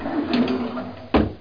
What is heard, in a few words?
DRWR CLOS; 2000 Sound Effects